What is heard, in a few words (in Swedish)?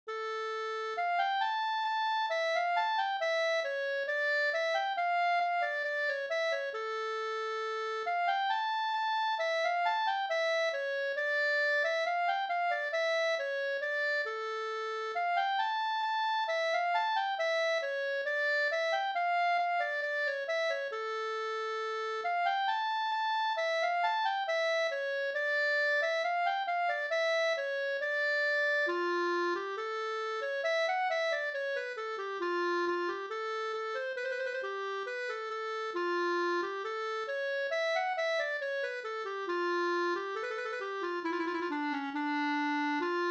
skanklat; Rattviks skanklat; Hook Olle